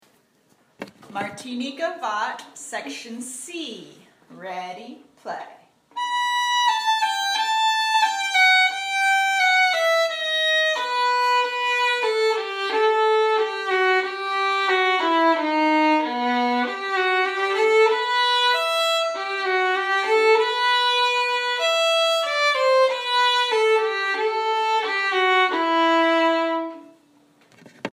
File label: Gavotte; Martini